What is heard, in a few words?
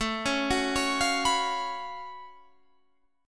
F sharp Minor Major 11th A